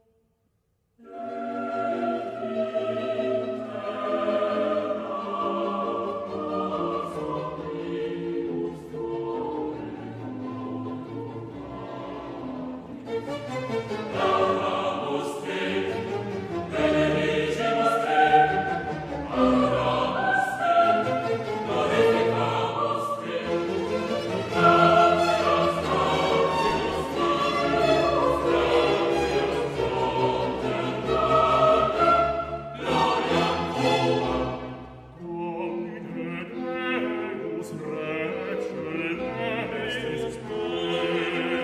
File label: Missa brevis in B flat major Gloria, chorus; Mozart